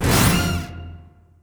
Orch Stab

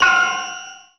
PLENG, Anime Sound FX; COMIC

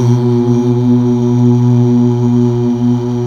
Mal Uuh, CH BACK; Best Service Gigapack 1

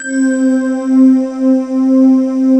TIME VOX

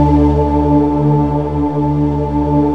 CMI VOICE, Techno Trance; Combo 1; E-MU Formula 4000 Series Vol. 2 – Techno Trance

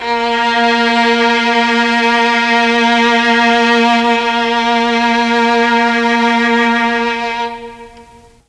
A#1 VLNS, Mellotron; CHMB 3 VLNS; Mike Pinder - Mellotron Archive CD

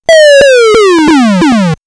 Elec FX Computer Game; X-Static Goldmine

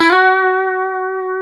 F#4, Guitars; Spectrasonics; Hans Zimmer Guitars VOL-1; 90's Sample CDs; COMP POP+VIB

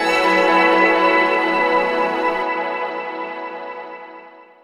pad, Jungle; E-Lab; XTC Files of Jungle

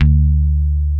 D2, Steinberger; InVision Interactive; Lightware VOL-1; Instruments & Percussions

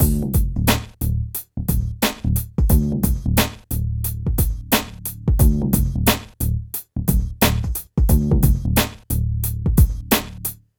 PHATT BEA; E-MU Formula 4000 Series Vol. 1; Hip Hop Nation; PhattBeats88-90

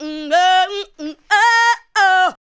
ADLIBS 90; Ueberschall; Jam Box

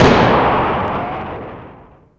canon, Sound Effects

